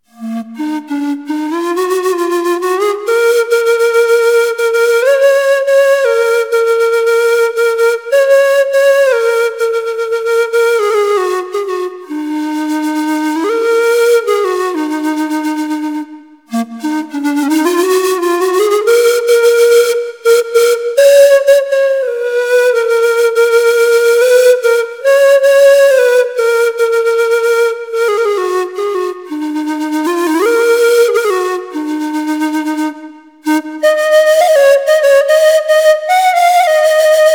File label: Quena